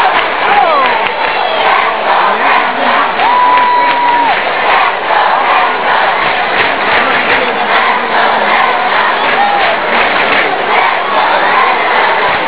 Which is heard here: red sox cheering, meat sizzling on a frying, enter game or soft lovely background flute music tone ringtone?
red sox cheering